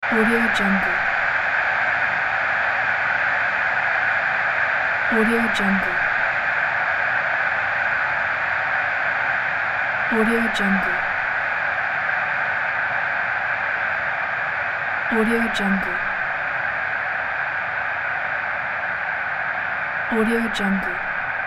sports crowd background

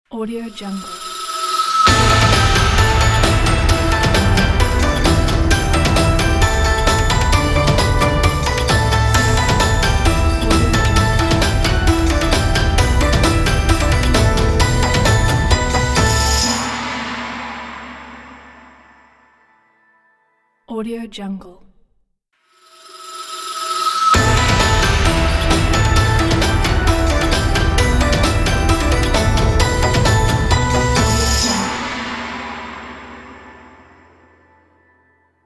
chinese new year traditional logo

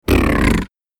menu negative; interestingmedia

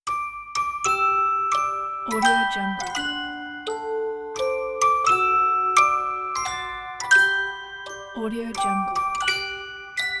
happy birthday music box

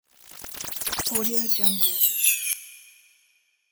uplifter; 3dhome

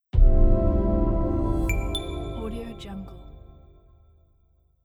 soft short atmospheric smooth logo reveal

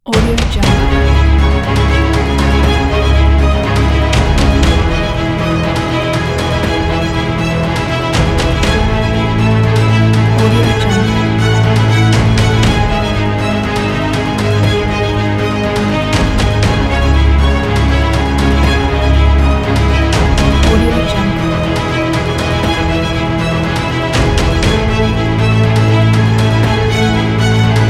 heroic battle

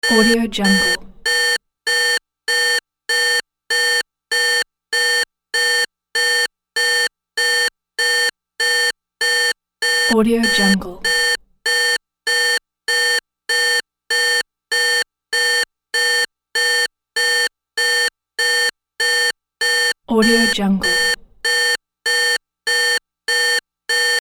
electronic beeps; sound ideas

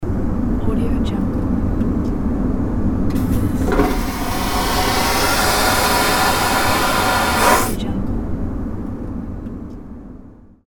airplane toilet flush

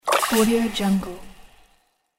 magic potion splash

